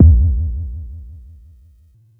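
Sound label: Mod bass drum, Jomox Airbase99, Drum Machines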